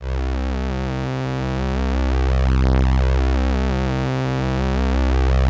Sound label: LAMBDA, String Machines